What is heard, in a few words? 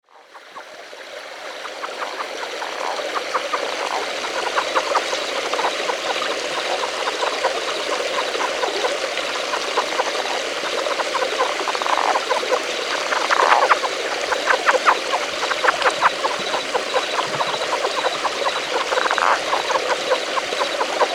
relict leopard frogs; telinga